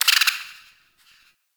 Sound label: Forest Distant Medium Creature Tree Small Call